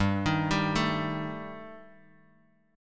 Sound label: G Suspended 2nd Sharp 5th, Guitar Open D; chordshape; down